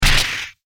会心の一撃

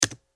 タイピング パンタグラフ

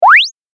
短い音 プイッッッ

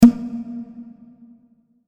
卒業証書入れ ポンッ 響きあり

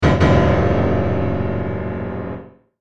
ガガーン

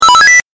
8bit 獲得

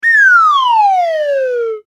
スライドホイッスル下降

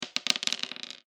中くらい ダブル ダイス

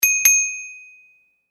自転車 ベル

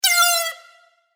グラビティチューブ

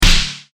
打撃

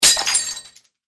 お皿が割れる音

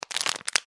ペットボトルをつぶす

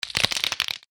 壁にヒビ

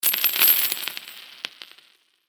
小銭をばらまいた音

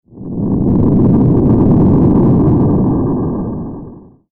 地響き 短

